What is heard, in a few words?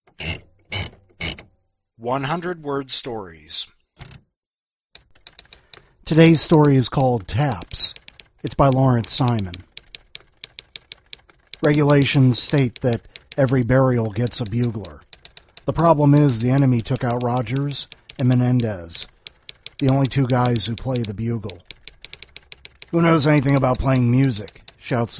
taps